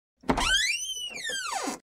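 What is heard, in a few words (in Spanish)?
puerta abriendose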